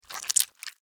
Squish Squirt; Foley; Squishes; Sound Effects:Objects

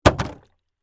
Jug Set Down Full, Water; Foley; Set Downs (Sound Effects:Objects); BlastWave

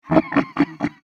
Horror, Devil, Laugh; Evil Laugh Sound Effects; Evil Laughs (Sound Effects:Horror)

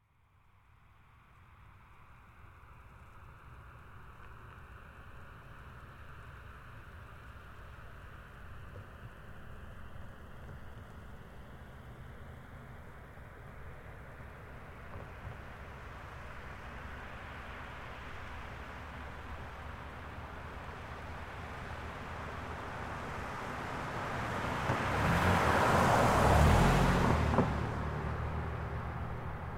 Car Highlander By Slow; 2005 Toyota Highlander V6; Cars; Vehicles; Big Room Sound

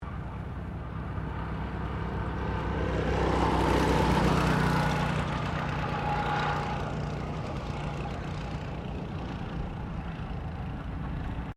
GOCART PASSING, Gocart track; Gocart track in Queensland; Go-Carts; Vehicles

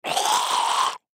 Human Choke; Choke On Blood; Gagging; Blastwave

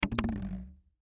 Bottle Water Full, Bottle Water Full Set Down; Bottle Water Full Set Down Cement; Blastwave; Foley

